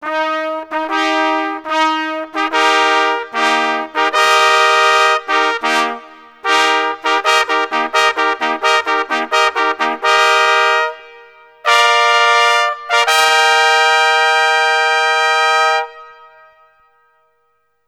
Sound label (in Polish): hejnal; symbole